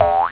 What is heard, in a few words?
boing, echolink bip sesleri; TB2AJ